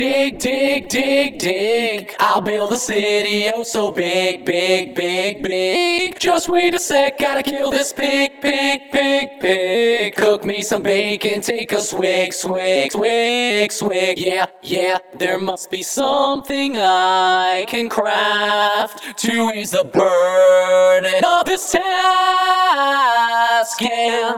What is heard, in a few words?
Vocals